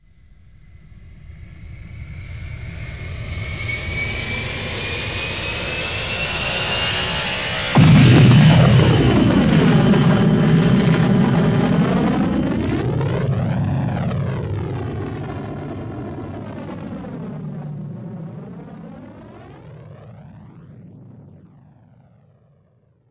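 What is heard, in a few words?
shp takeoff, ship; millenium falcon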